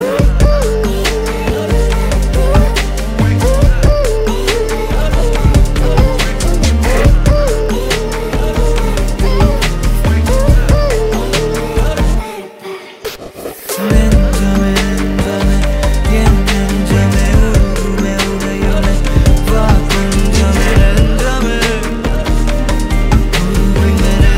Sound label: BGM Ringtone; Doctor